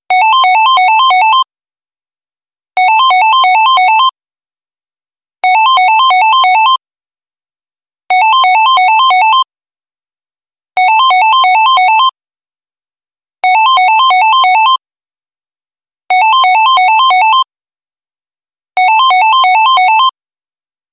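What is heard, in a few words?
simple ring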